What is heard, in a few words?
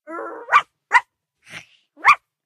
yapping; Voice